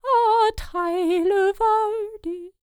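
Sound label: Scandinavian, World Voices; ILIO; Vocal Planet VOL-5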